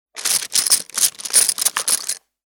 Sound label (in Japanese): 点棒を取り出す; 場面切り替えや心理描写; 演出効果音